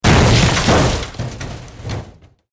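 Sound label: Explosion Concussion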